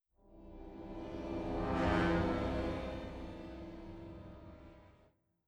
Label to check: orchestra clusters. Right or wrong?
Right